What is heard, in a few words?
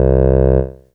Casio MT-45 Bass II C2